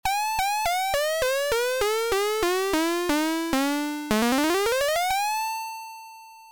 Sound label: micro cosm voltage